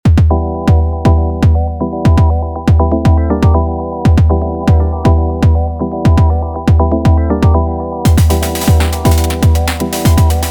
Some noisy industrial acid-rave thing, MC-707 and SH-4D